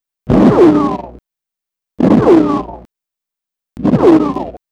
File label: rifle